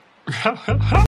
laugh; yoda

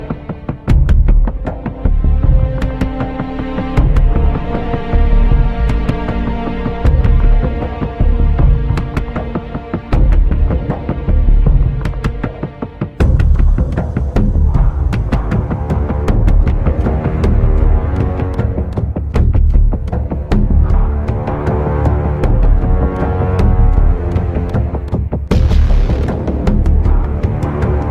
Menu Music; MW2

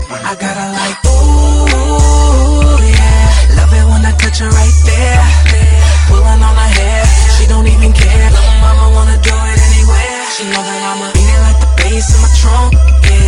bass

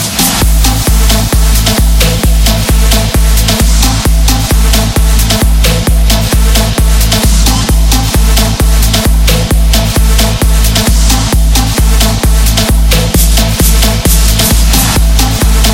trance

